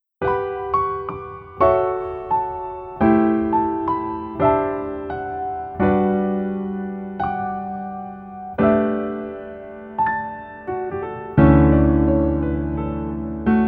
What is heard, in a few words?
Piano ver, 멜로디MR